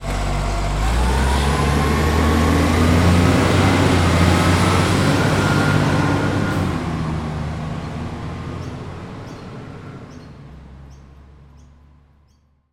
truck garbage pull away bird noise; transport

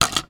household sunglasses plastic down on wood table; zapsplat

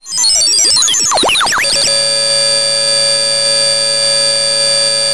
science fiction robot malfunction flatline; zapsplat

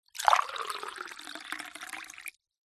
food drink water pour into small drinking glass

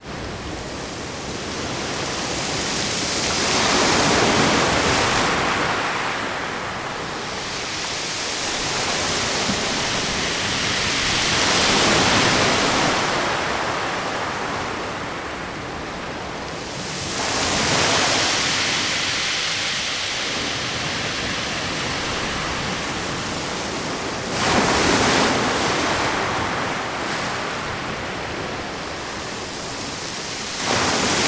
water ocean waves break on beach

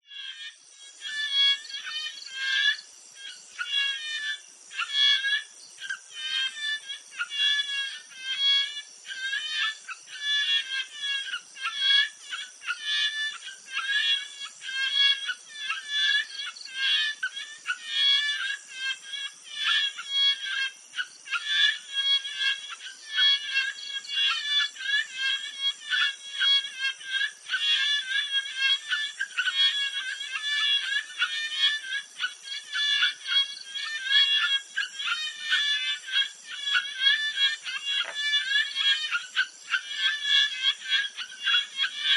thailand jungle night pond frogs mating

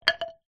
foley wood pole drop hard ground; zapsplat

